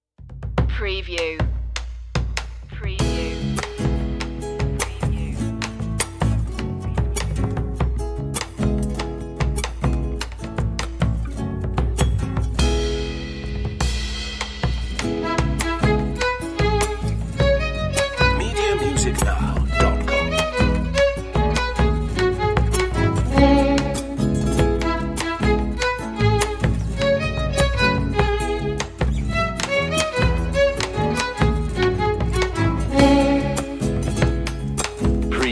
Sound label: celebrating world music